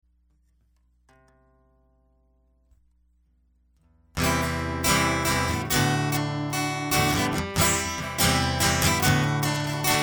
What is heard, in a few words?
Guitar